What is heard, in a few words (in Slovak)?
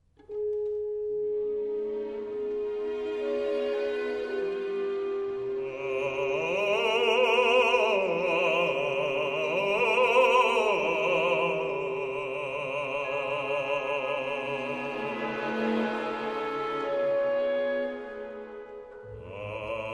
Hudba pre bas